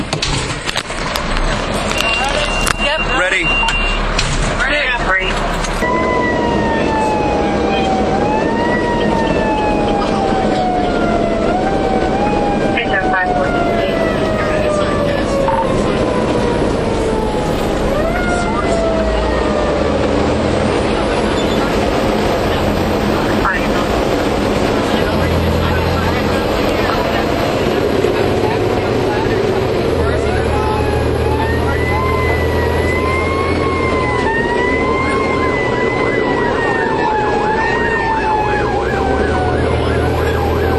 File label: ride along